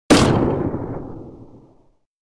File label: 37mm hotchkiss